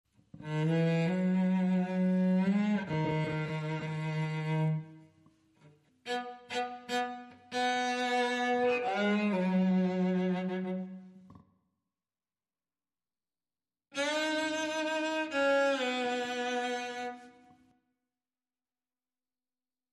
Cello Clean

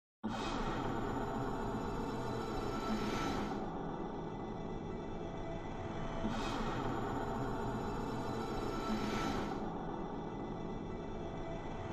Drones

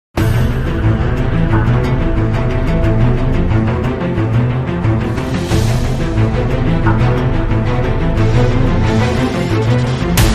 Crime Sting

